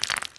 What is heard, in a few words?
Ui Money; Knight Online